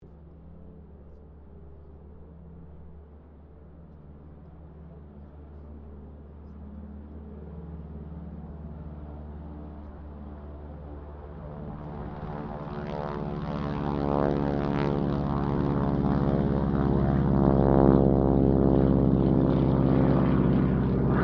AIRCRAFT AT-6D HARVARD MK IIB TAKE OFF, Harvard Training aircraft; 1942; Airplanes (Sound Effects:Vehicles)